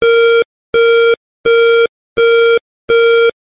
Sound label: Sound Game over